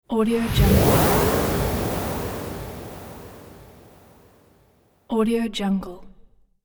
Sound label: pro magic teleport